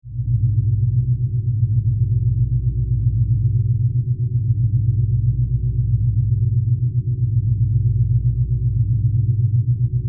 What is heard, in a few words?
Drone Spaceship, Drone, Spaceship, Interior, Hallway, Power, Source, Flutter